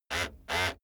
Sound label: servo sequence